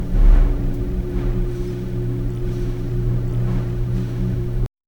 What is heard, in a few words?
low distant elevator chim; indoors